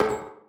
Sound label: pipe step; Golden Crayon Sounds